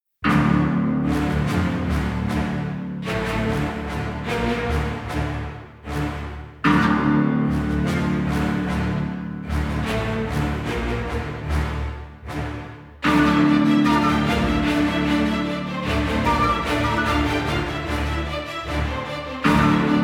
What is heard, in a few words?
Combat; Skellige